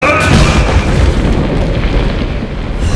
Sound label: Thunder